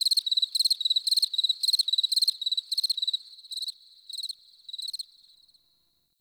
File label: Insect Night; Warframe; Eidolon Plains; Ambience